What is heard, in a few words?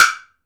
WOODBLOCK, PERCUSSION; GM PERCUSSION KIT; AKAI S6000 CD-ROM - Volume 5; GM PERCUSSION KIT 1